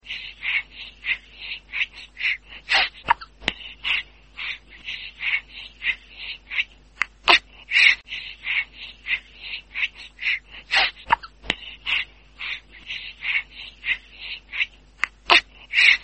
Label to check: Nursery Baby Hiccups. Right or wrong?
Right